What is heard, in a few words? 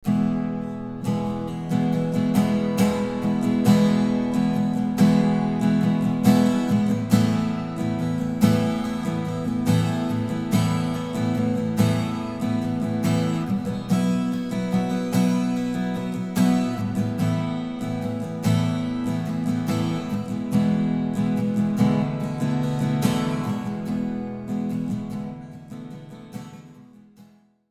plate